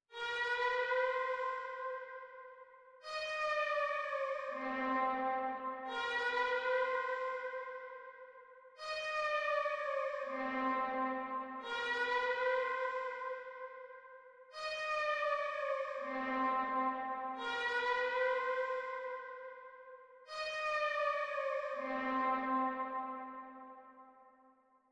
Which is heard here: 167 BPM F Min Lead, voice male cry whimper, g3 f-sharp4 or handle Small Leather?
167 BPM F Min Lead